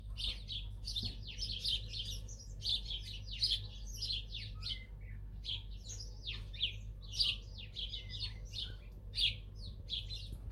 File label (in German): Vogelstimmen; tannheimertal